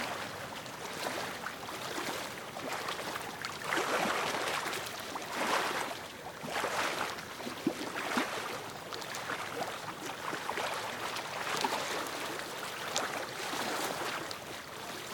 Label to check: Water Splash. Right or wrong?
Right